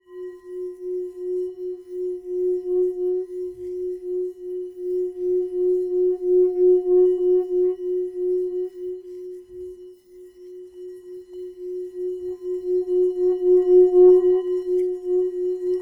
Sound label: Large Bowl Rub, Tibetan Singing Bowls; Percussion Samples